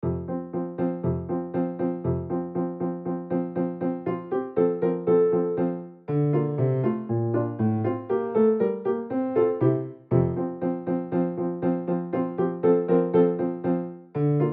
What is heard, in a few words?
Tambourine med; Gossec